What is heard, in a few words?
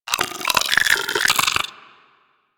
Arachnoid Vocal Grapple; Warframe; Corpus; Arachnoid Hunger